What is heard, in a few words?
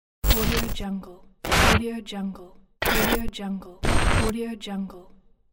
glitch transitions